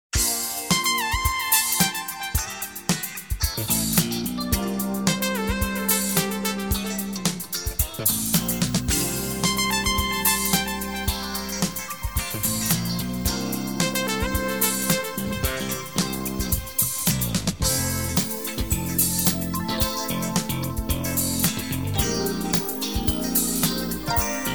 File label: brass minus